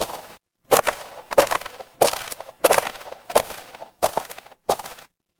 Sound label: footsteps gravel